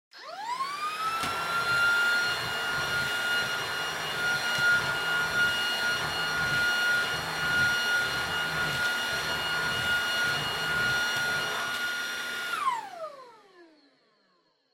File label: Vacuum Cleaner Vacuum Floor High